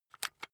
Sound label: rock drill head remove heavy; maschinen; bohrer; soundpool